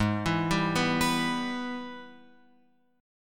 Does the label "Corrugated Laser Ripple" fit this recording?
No